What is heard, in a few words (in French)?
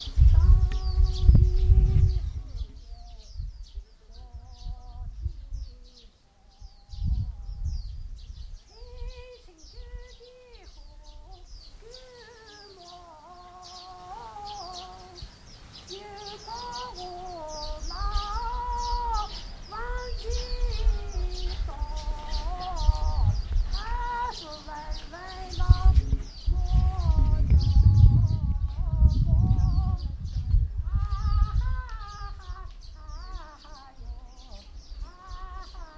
Chine musique dans le parc du temple de Confucius